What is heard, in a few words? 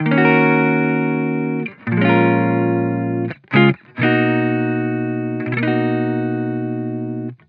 Vibrations Chill Guitar Loop 128 BPM D# Maj; Cymatics; Vibrations Guitar Sample Pack